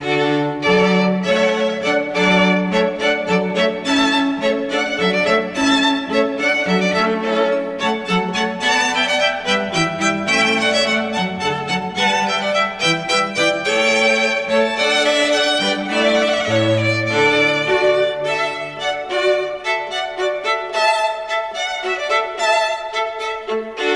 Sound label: Hornpipe, quartet; G.F. Handel; Water Music